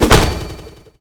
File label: spectral; garrysmod